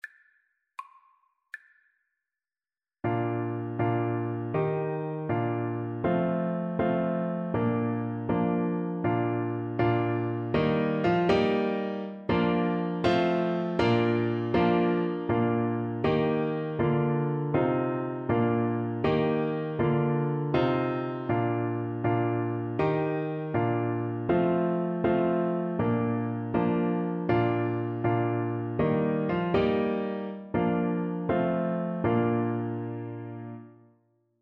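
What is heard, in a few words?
for hes a jolly good fellow, alto saxophone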